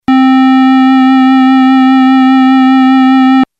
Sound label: Wave SQU, Roland D-50; Waveform demos